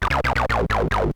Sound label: weird hit sound; SNES; SMK